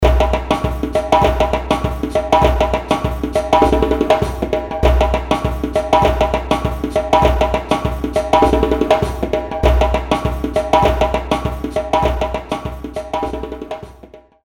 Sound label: Djembe; Apple GarageBand 2; Apple Loops